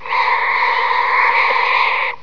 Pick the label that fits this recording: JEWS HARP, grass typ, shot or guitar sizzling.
grass typ